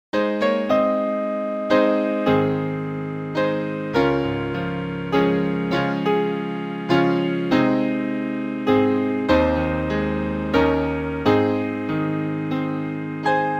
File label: instrumental hymns; must jesus bear the cross alone